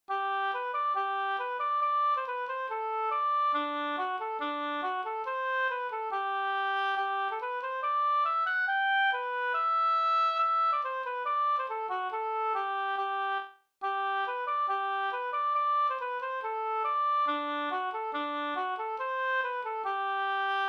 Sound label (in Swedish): schottis 4-4; Kaakuri LouisM55p28 V1